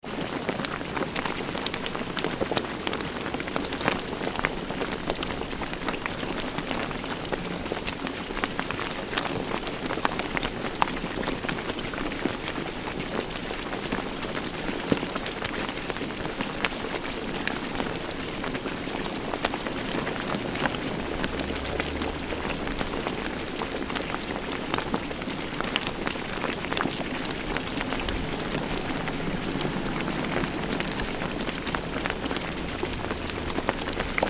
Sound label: sake brewing